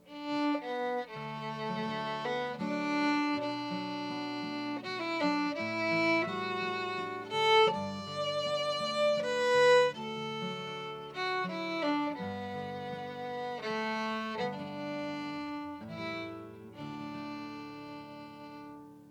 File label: fiddle